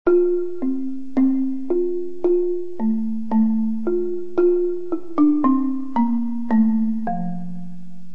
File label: sonatina pitch bend 12 semitones